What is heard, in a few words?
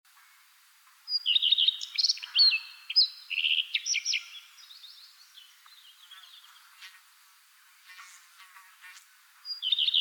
Redstart Sound